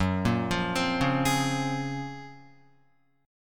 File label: Gb Major Sharp 11th, Guitar; GbM#11 arpeggiated; JGuitar